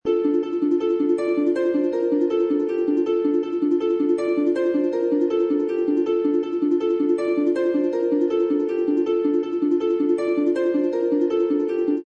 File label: Harp Dm, Harp loop Dm 160 bpm.; Harps; Sound Effects:Musical